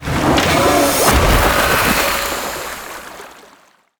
hero ultimate

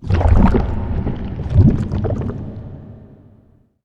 bubbling lava AMB

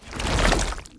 inner Beast out; heroes grimson

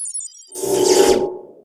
hero alien drone call

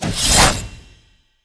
double strike; eridan

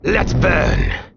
Elemental

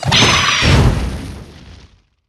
phoenix immolation

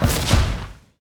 enemy turtle Shaman death

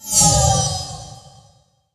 shield break; malicia

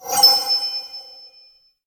arcane archer sleeping arrow

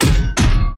enemy enrage attack; sfx

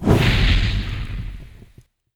volcano lava shot

